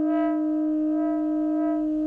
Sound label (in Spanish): Multiarmonicos